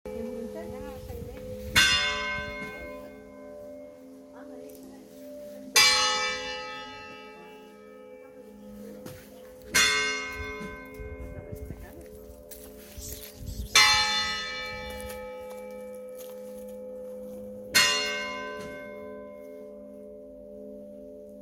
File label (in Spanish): sonido de muerto, campanas; burunchel